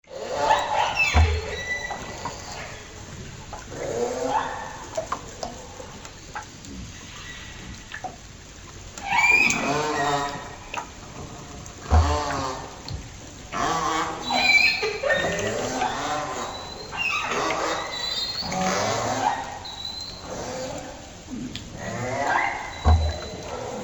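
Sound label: offshore resting, whales; Killer Whales (Orcinus Orca) are distinctively marked black-and-white dolphins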